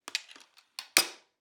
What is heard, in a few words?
closing bb rifle after pumping; Sonniss GDC